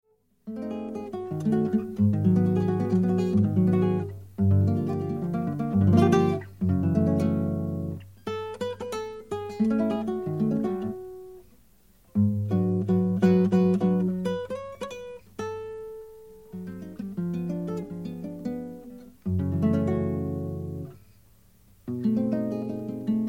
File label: viola solo guitar